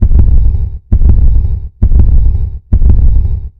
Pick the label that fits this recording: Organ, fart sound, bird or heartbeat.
heartbeat